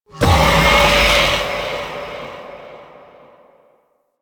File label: Embrace Siren Release; Broken Frame; Warframe